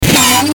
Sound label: Glitch Distortion Ascend; glitches; Blastwave